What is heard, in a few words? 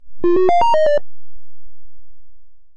alert; mario